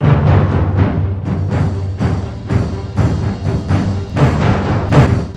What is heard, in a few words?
intro drums; Conan